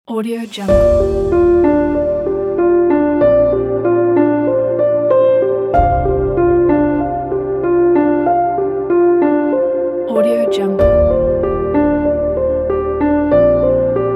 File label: ambient cinematic piano